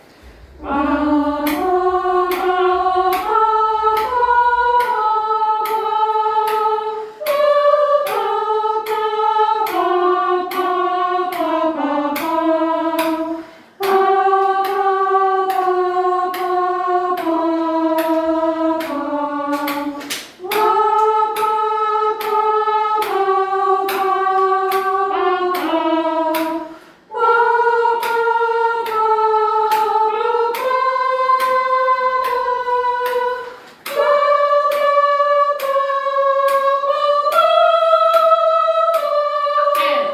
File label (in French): voix1, Soprani; Hansel et Gretel